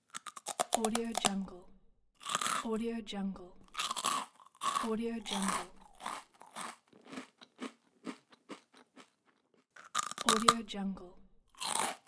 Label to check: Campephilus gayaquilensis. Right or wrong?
Wrong